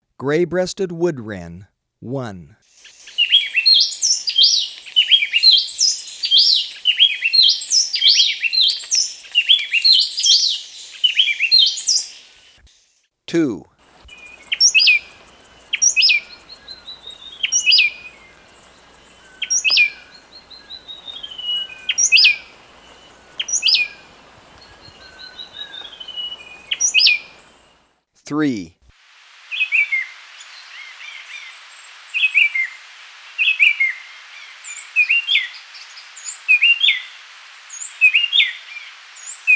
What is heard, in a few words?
Gray-Breasted Wood-Wren, Birds of Ecuador; S. America